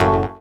SYN, dance; Sound & Vision - Gigapack II CD 1

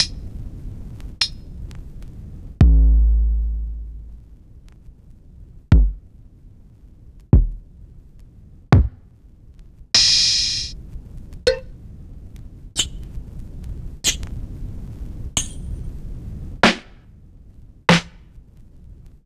Vinyl, Kit; Sliced

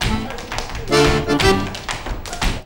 ACCORDEON; Best Service; World Colours; ACCORDEON+ST

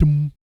BS DMM, Vocal Planet Jazz & FX; ILIO; BASS DUMMS

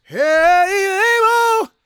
GOSP MALE, Vocal Gospel; Vocal Planet Vol1 Gospel

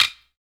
Sticks; SB Sticks

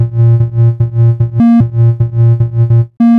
150 Sinebass, 150bpm, Nu Dance Electro; Combi Loops; Zero-G; Soundclash Nu Dance Electro; Acidized Wavs

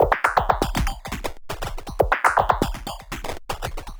Anabeat Mix, Beats

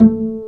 STR Cb Pizzicato; Roland L-CD702; Cb Pizz 3

